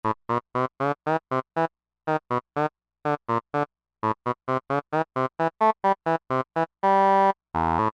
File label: bassoon, Korg M-500 micro-preset